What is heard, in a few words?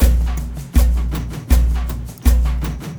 AF TRANCE; Spectrasonics; Heart of Africa 2